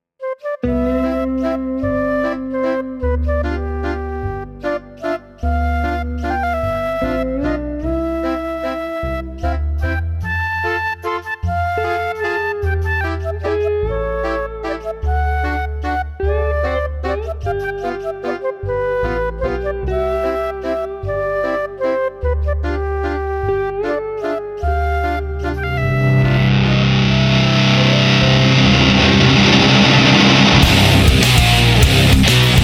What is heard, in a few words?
Silly ol heavy metal